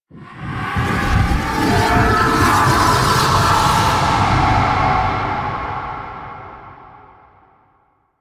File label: Tunnel Transition; Warframe; Quests; Sacrifice